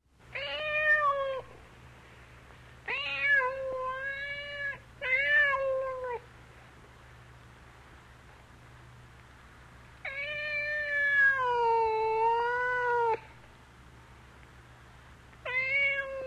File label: Cat meowing for food